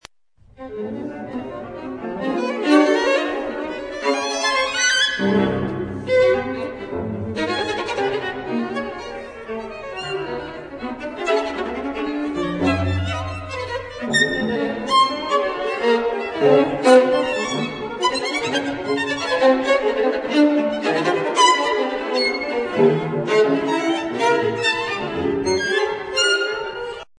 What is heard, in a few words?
String Quartet